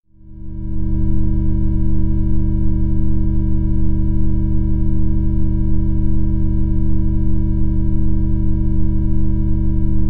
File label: clarity brainwave balancing low triangle